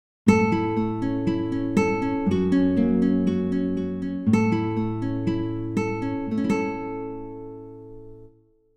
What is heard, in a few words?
nylon guitar; rock and pop